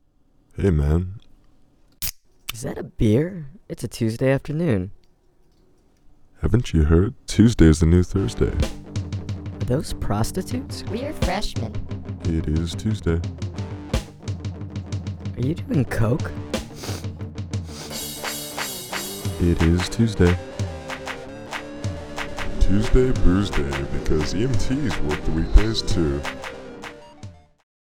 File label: Cymbal; spellman studio